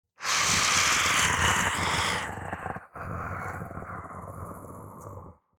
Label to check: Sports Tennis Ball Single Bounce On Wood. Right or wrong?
Wrong